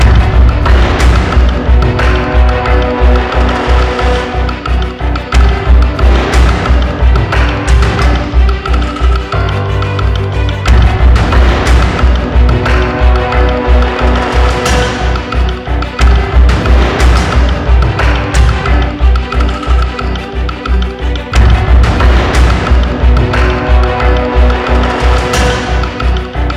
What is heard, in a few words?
Dramatic Drums Chase; Toby: The Secret Mine